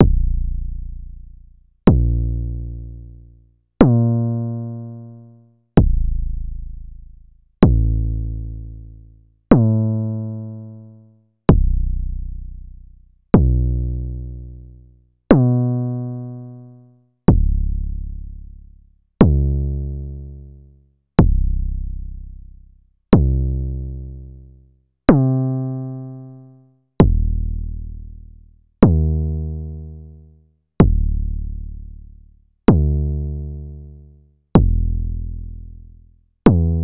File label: Kick Multi-sample